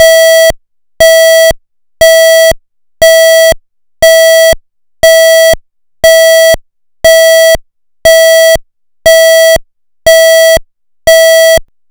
Interrupted Sweden, Sweden SS031711 (local warning); Interrupted tone; pfannenberg; signaling-technology; combined-signaling